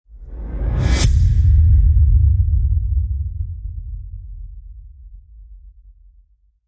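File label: Hit Swoosh Critical Size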